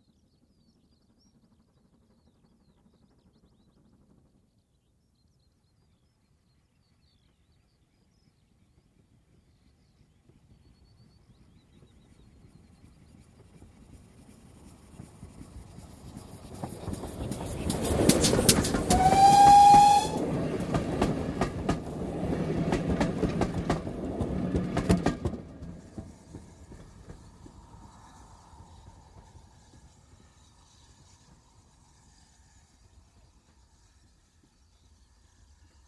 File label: Steam Train coasts past left to right with whistle; Trains; BBC